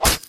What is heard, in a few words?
blade slice, weapons